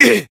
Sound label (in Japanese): Voice damaged jp; majuou